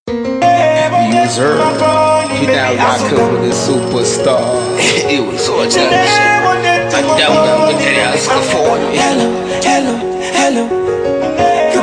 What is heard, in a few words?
hello piano try out